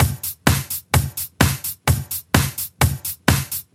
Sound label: House Beat, 128 BPM